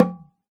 oxaca, percussion; ILIO

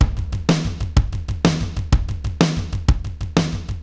Akai XE8 (125BPM), beats; musicradar; cyborg beats

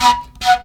FLUTE GRV; Best Service; World Colours

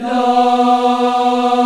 VOX, African Aahs, African Las

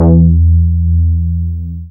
BAS MINI, MOOG; Sound & Vision - Gigapack II CD 1

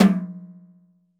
Lite Tom, Roland BK-5

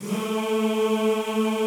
A2 R, Classical Choir; Best Service; Extended Classical Choir

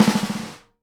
SNARE RUFF, Live Snares

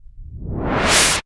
cinematic impact wipe

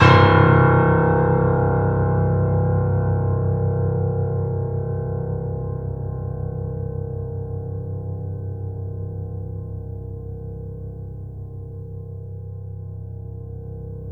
The Piano, sustain

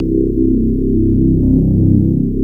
electric pianos; Wizoo Electric Pianos

